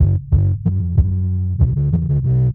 bass, Loops, Phonky

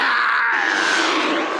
scream monster; ooh ahh

